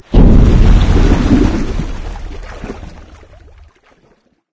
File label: train splash; video games; ambient; source engine; portal2; outland